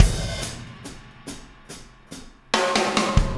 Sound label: Impact Crater Beat, Processed Drumkits